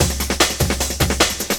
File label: LOOP, Drum & Bass, 150 BPM